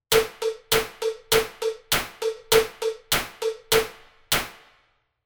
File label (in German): Gehoer Rhythmus Beisp; Gehoerbildung